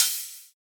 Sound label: subtle open high hat f key, drum single hits one shots; WAV (96000 Hz)